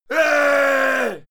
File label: male fight scream; schreie; menschen; soundpool